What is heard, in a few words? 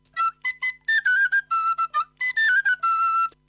Tin Whistle Solo track